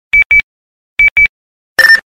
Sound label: bibip